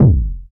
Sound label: BD, Synth; Sound & Vision - Gigapack II CD 2